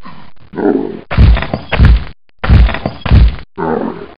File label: Gargoyle